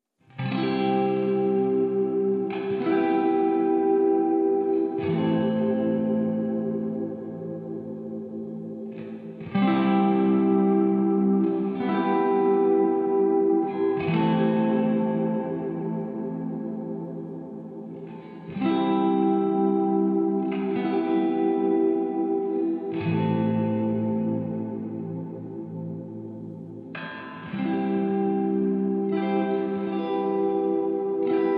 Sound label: Voices and Guitar Mix